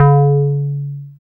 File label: Bass SH-101